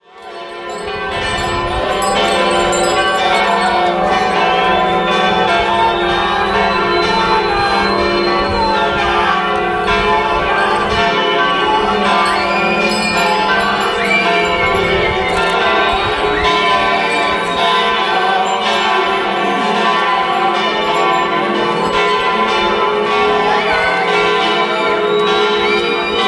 profanierung kirche manheim letztes glockengelaeut

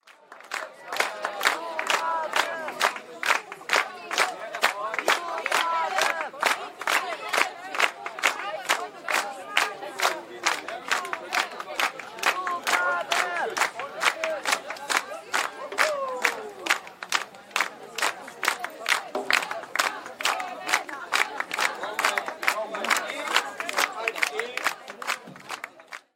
applaus; lebenslaute; kantate geheimdienste abschalten

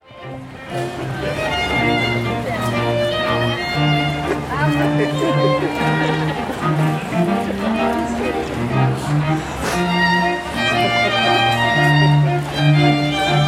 klassische musik; 4 gutes klima festival

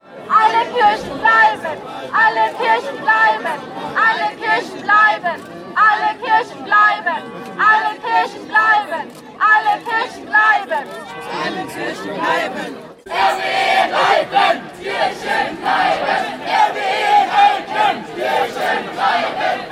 protestrufe; profanierung kirche manheim

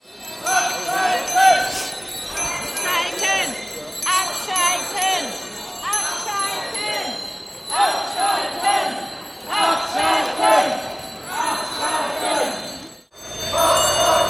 fahrraddemonstration protestrufe; tour-becquerel

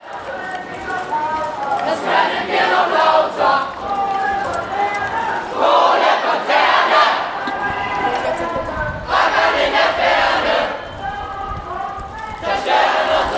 protestrufe; fff-aachen-internationaler-zentralstreik

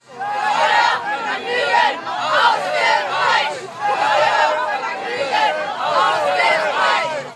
demonstration gegen zirkustiere; zirkus probst

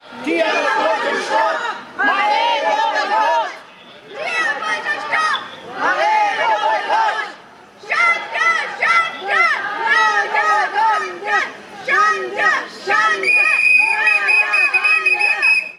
tierrechtsdemonstration protestrufe; duesseldorf; vor gegen maredo

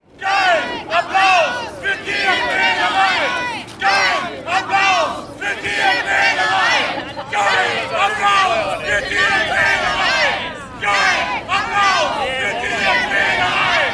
demonstration gegen zirkustiere protestrufe; vor circus probst

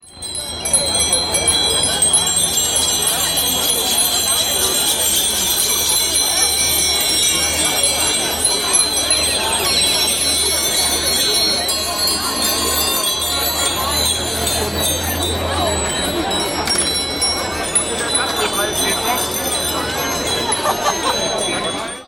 fahrradklingelkonzert; 2 rad nacht meerbusch; abfahrt

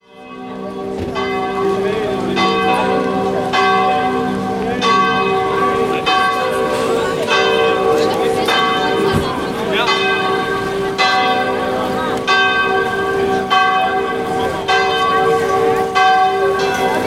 kirche glocken; profanierung kirche morschenich

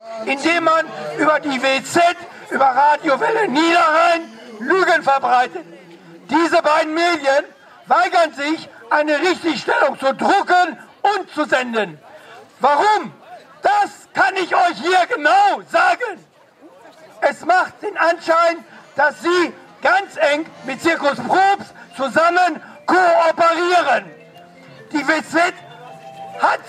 demonstration gegen zirkustiere; zirkus probst

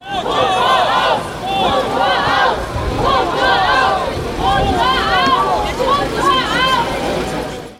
motor aus; profanierung kirche manheim

